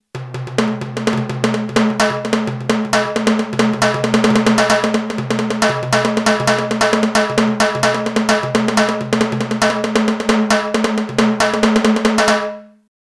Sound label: Timbales; fete de la musique 2017